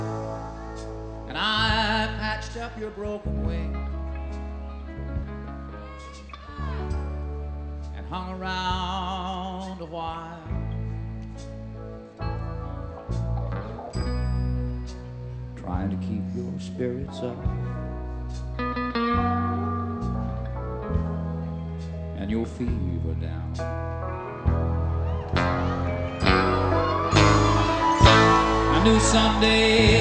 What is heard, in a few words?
country